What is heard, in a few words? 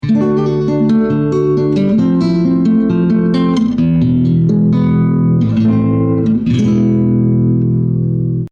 nylon, guitar